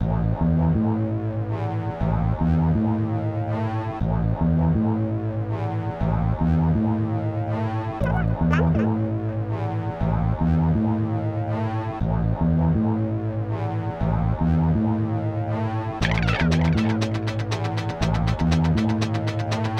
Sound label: ambient suspense; Sabotain Break the Rules